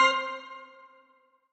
count Up Loop End; netent; reelsteal

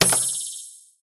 big button click; netent

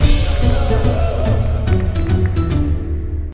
big Win Loop; netent; kingof3kingdoms

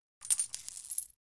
pick n Click coins; netent

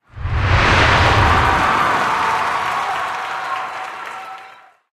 bonusgame Camera Pulls Back; netent

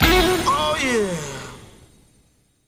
small Win; netent

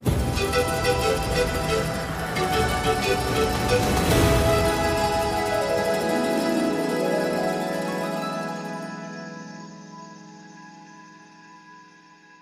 Free Spin Intro; netent; eldorado; gonzo

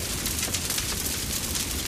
scatter Near Win Fire; netent

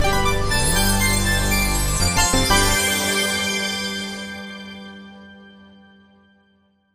large Win; netent; butterflystaxx

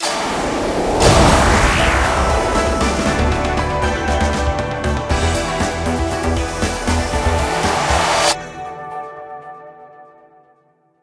big Win Start; netent; starburst

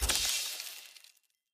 spin Button Click; netent; butterflystaxx

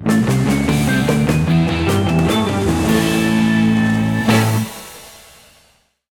outro Free Spin; netent; wildwater

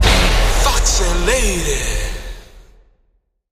big Win End; netent

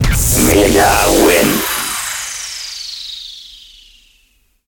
mega Win; netent; neonstaxx

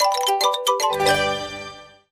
attention sound; netent; eldorado

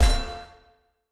spin button; netent; copycats

